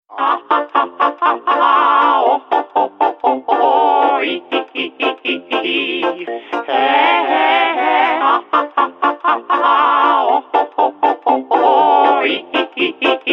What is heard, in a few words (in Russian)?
melodiya klouna